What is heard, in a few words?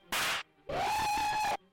smack reee